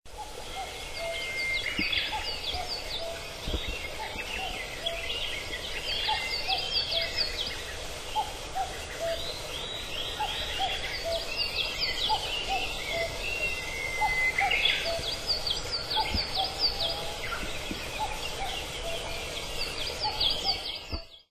common cuckoo

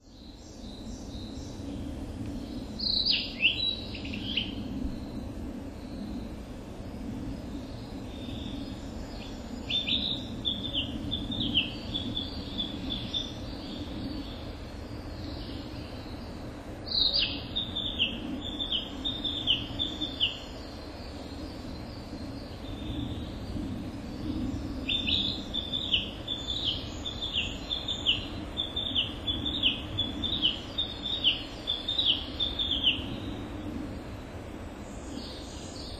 narcissus, birdlistening

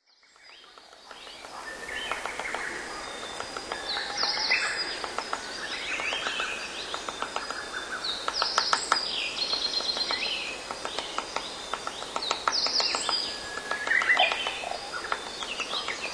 pygmy woodpecker

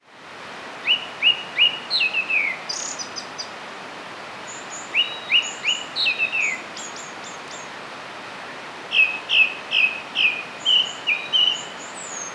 grey thrush

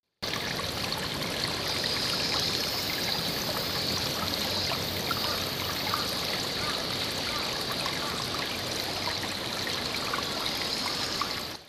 spring

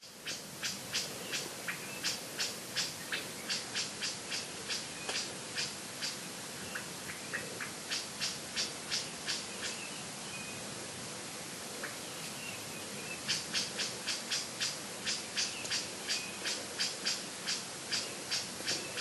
bush warbler

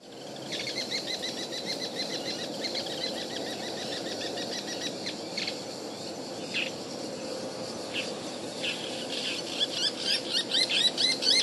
bull shrike

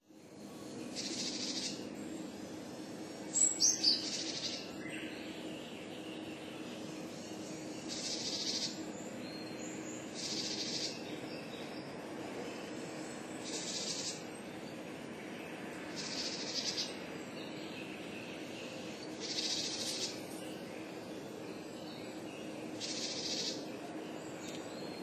great tit

